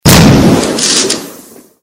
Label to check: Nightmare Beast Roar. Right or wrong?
Wrong